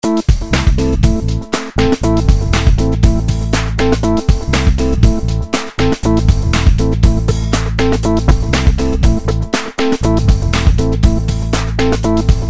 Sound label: arp